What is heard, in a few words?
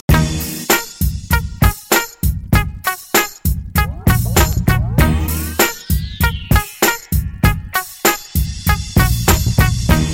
Hip Hop Rap Blend 103 Bpm; Mainstream VIP; Vegas Edit